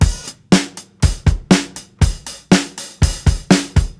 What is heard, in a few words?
STRAIGHT OPEN HH, breaks, drums; Basement drums vol 2